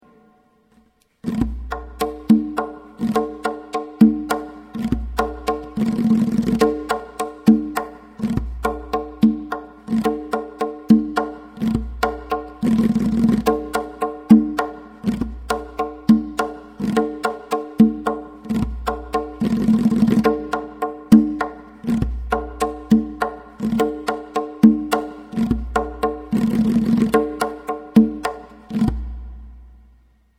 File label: tonbak; In this variation you add the roll (riz-e-por) in the 3rd bar; The right hand bak is substituted by a meyaneh.